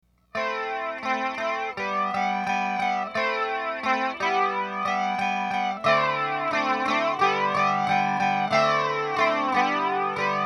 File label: Guitar